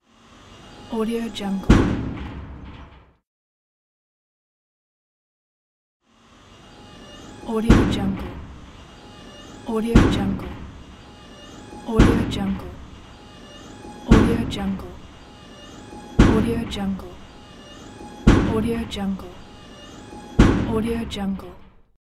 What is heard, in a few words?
big robot footsteps